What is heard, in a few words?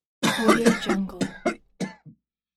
male coughing